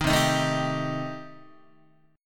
C Minor 6th Guitar, chord; Cm6 strummed; JGuitar

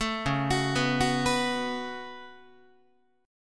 Db 7th Suspended 4th Sharp 5th Db down Guitar

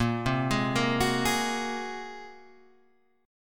Bb 7th Suspended 2nd Sharp 5th Bb; Bb7sus2#5; arpeggiated; x,x,8,5,8,5,8,5; JGuitar

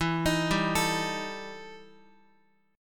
E Minor Major 11th E Guitar; EmM11 arpeggiated

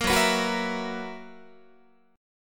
Gb 11th, Guitar

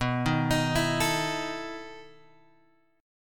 B 11th B, chord; B11 Chord {7 6 X 8 5 5} arpeggiated

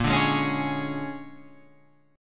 A sharp 7th Sharp 9th A sharp down Guitar Open F; A#7#9 strummed; JGuitar

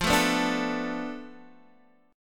F 6th Flat 5th F down Guitar Open D, chord

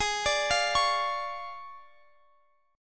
D Minor Major 7th Flat 5th G sharp

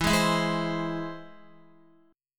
E Augmented, Guitar; strummed

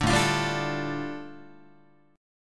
A 7th Suspended 4th Sharp 5th A, Guitar; A7sus4#5 strummed

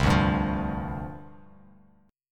C Suspended 2nd Suspended 4th C Bass Standard; Csus2sus4 strummed

